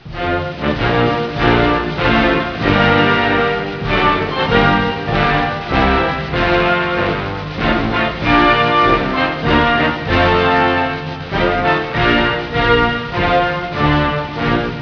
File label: anthem